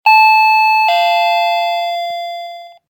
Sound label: doorbell